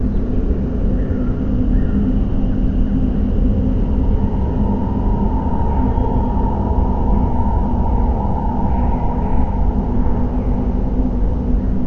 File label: Night Ambience